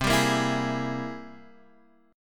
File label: C 7th Flat 13th C, chord